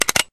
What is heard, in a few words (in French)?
hover bouton commencer partie